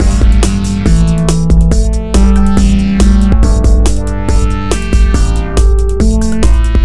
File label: ringtone, electro; Google; NewWave Labs